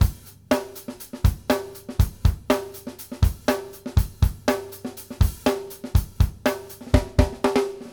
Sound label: BASIC C L, Drumworks